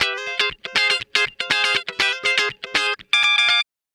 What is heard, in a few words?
Guitar Licks